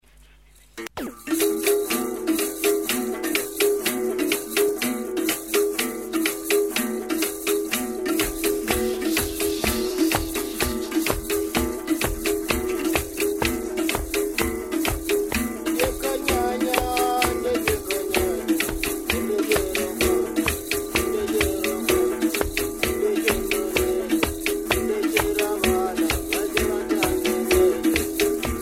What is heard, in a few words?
inzebe, rwanda